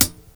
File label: good hat sound B key; drum single hits one shots